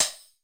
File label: Tamboo, Alesis D4